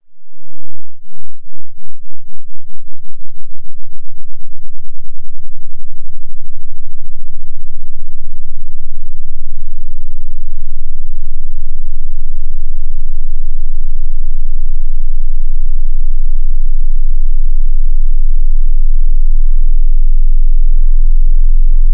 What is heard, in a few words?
sweep 0 Hz to 100 Hz in 100 sec